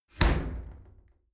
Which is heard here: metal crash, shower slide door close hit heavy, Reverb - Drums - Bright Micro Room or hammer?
shower slide door close hit heavy